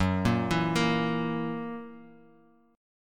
F sharp 7th; 2,1,2,3,x,x; arpeggiated

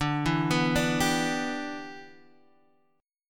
E Minor 7th D

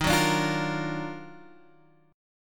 D sharp Minor Major 9th D sharp down

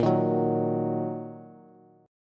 Bb Suspended 4th Sharp 5th Bb down Bass; strummed

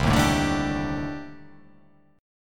C Minor 6th Add 9th C

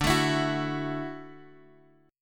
D-Major Add 9th D down Guitar Open G

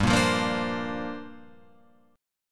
G Minor 11th, chord, Guitar Open F; Gm11 strummed; JGuitar

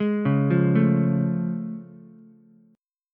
A Augmented Major 7th A, Ukulele; arpeggiated; JGuitar

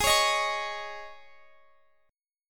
G sharp Suspended 4th G sharp, Guitar Open F; strummed

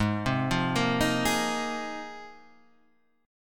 G sharp 6th Add 9th G sharp Guitar Half Step Down

